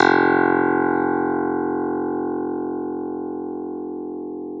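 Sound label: clavinet; Roland LCDP10 Keys of the 60s and 70s 2; Clav DB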